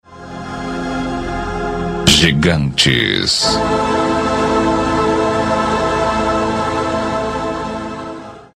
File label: ooooooooohhhhhhhhhh